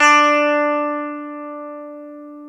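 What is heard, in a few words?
guitar electric 12 string; Club-50 - Foundations Roland; E.12 String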